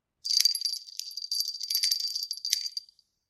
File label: bells